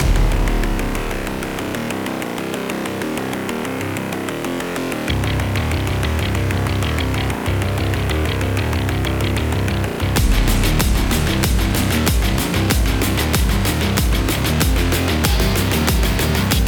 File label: Active Sports Dubstep Rock Alternative